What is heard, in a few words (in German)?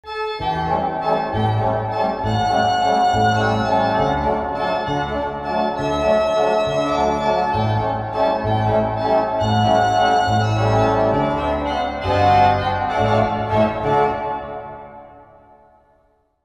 lastwagenorgel intro; kichor 2026